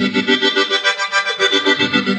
keys harmonica; Loops; Phonky

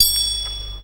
ting, Bells; Misc Percussion

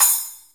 smallest, cymbals

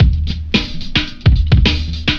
Drum loops Boombap

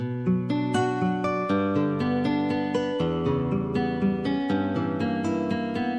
guitar, Sad

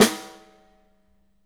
cairy, snares real

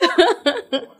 laugh drag; ooh ahh

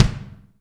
Kicks Loud

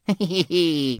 hee hee hee, laugh; Voice; ooh ahh

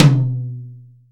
dog, Toms; Real

